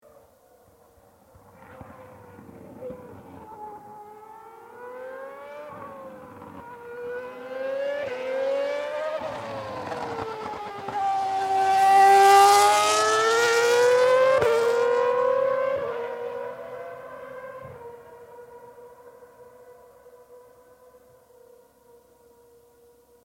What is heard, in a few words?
mbgp accelerating, Mercedes W02 F1 car accelerating shifting up sound